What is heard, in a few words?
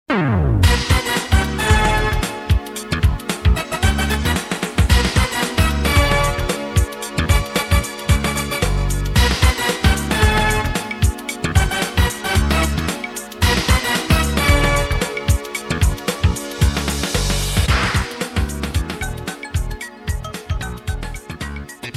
Instrumental